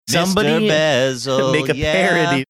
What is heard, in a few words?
singing yeah